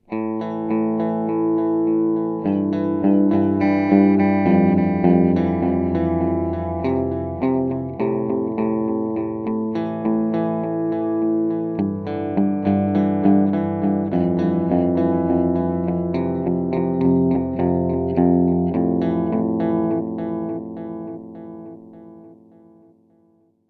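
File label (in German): EQ weniger Höhen; Free the Tone Future Factory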